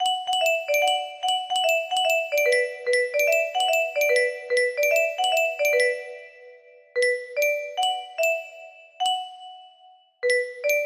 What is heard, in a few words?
music box; Spidey